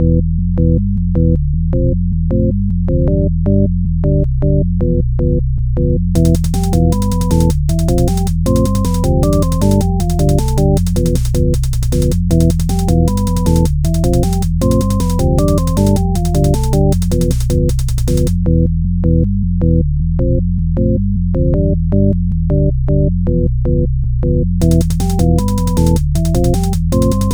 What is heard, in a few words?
battle bgm loop